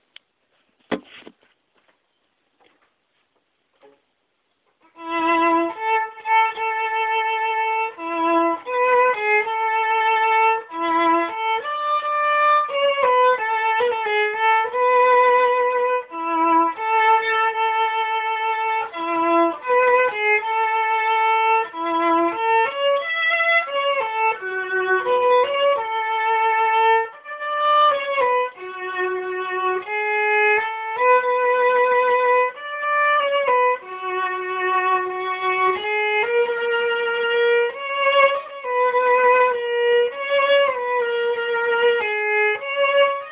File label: Bridal Chorus, Fiddler